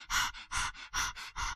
Meow; Mazepic; android gamerip 2018

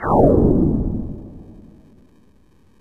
missed; stardunk ios gamerip

